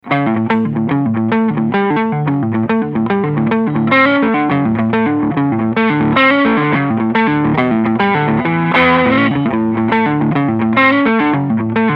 Low Gain

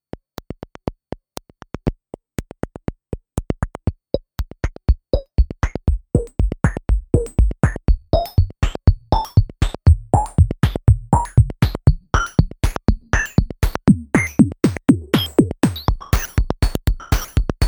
Mopho Beat; DSI

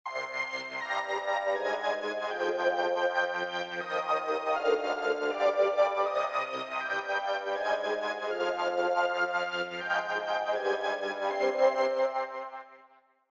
SY String Ensemble FX Chain

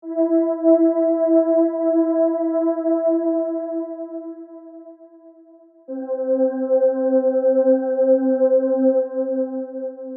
Choir wet; RefaceCS

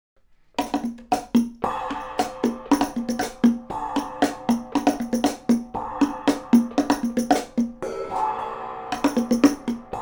Perc X Y, R-121

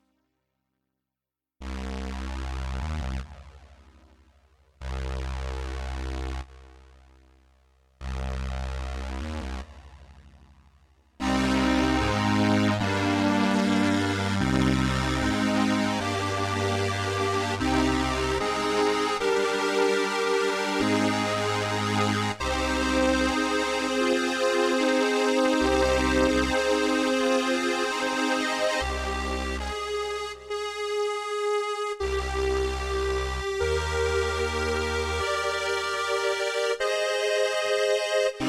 strings dry

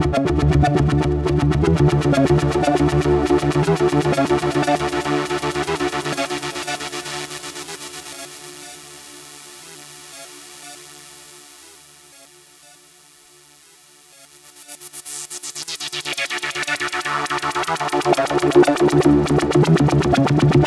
Bandpass Filter

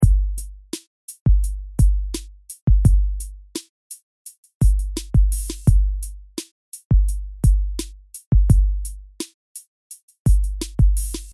Hip Hop

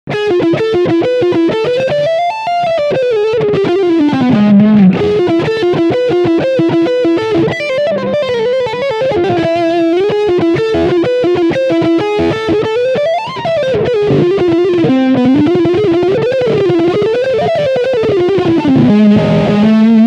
Lead Fronthumbucker

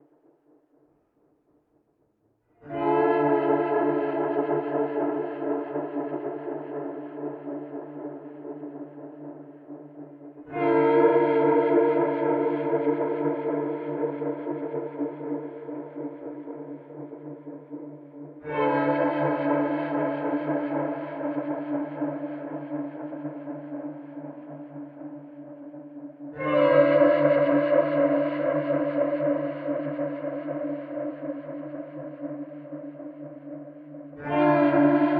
Mooer A7 Shake

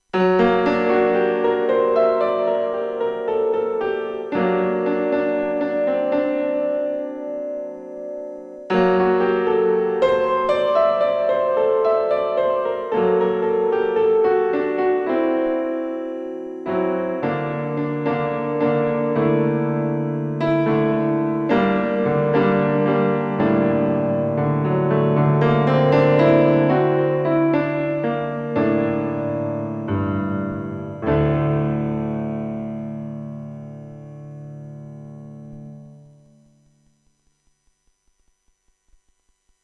MOD Devices MOD DUO X Piano Fomp Reverb

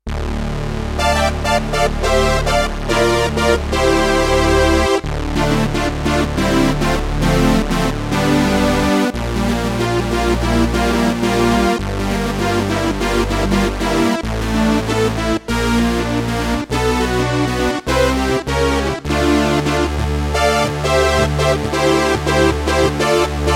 polysynth

